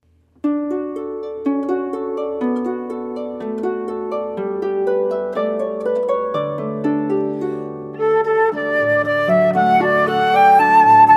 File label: flute and harp; Simple Gifts